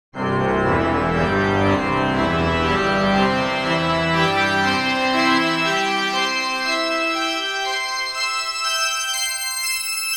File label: Organ